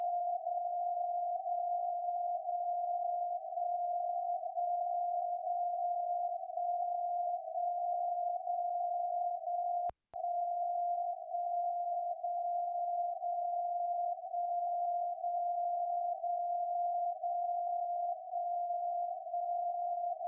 MSF 60khz

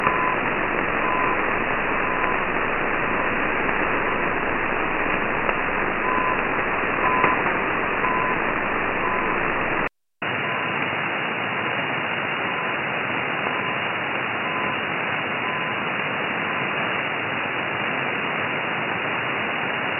USB CHU Ottawa; 14670khz; Empfang in USB und 2.8KHz Bandbreite. Antenne: NTi MegActiv; Hier scheint der Cloud-IQ empfindlicher zu sein.; Perseus

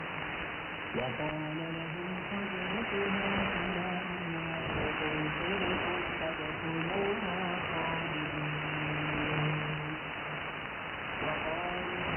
Holy Quran 17615khz SAM 6khz BW; SDRplay RSPduo; G33DDC

